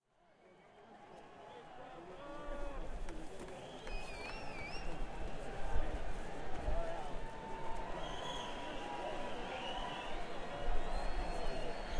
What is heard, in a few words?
Crowd